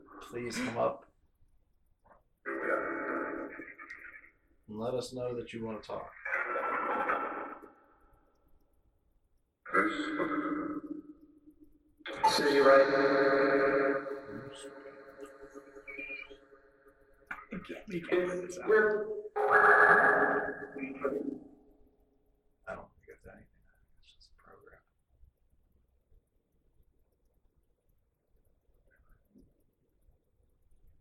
Hall Phasma; wexford general store antiques investigation

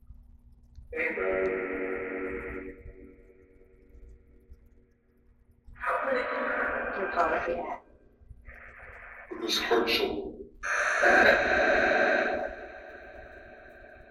2nd Floor Dark Wing Phasma Box

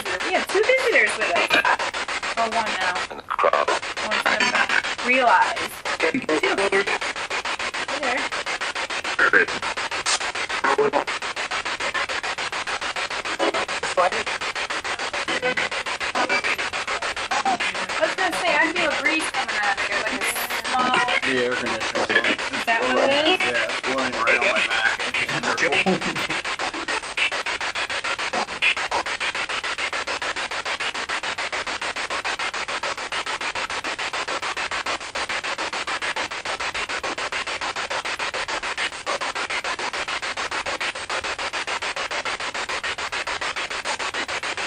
Spirit Box; Hallway; private residence freedom road apartment investigation return

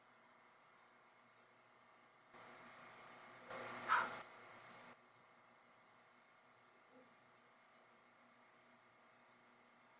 Chapel Static

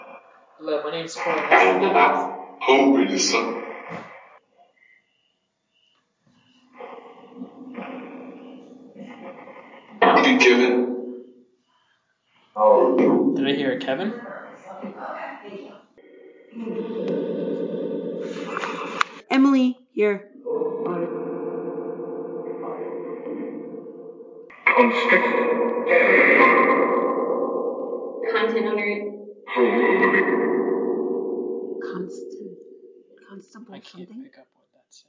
Train Station Chessies Room Phasma Box; Mars Train Station – Return Investigation 2024

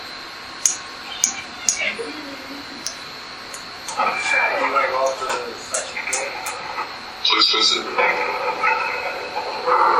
Basement Spirit Box; wildwood sanitarium investigation

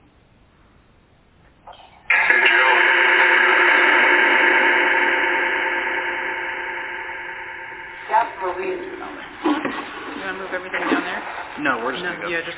Phasma

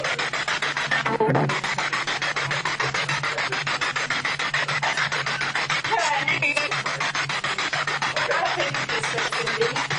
Spirit Box; Basment 2; gill house investigation

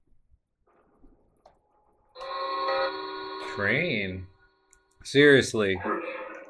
Tarot Card Room Phasma Box

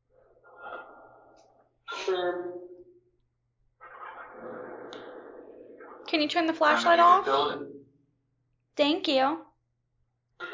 Tattoo Shop Phasma Box; After Hours Tattoo Studios – Return Investigation 2024

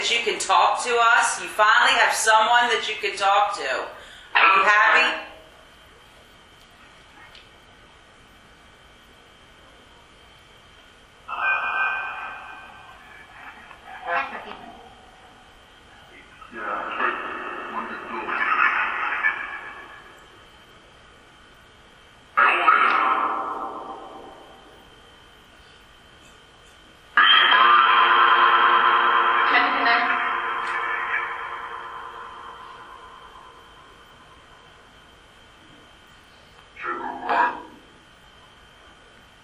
Carriage House Phasma; the castle at mount savage investigation

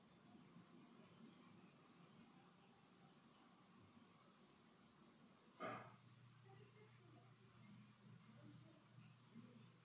Empty Room

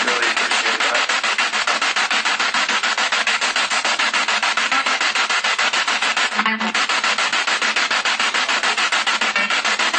Kitchen Spirit Box; private residence freedom road apartment investigation

